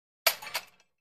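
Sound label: Gym Weight Equipment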